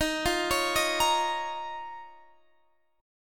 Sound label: D sharp 7th Suspended 2nd, Guitar, Standard; D#7sus2 arpeggiated; JGuitar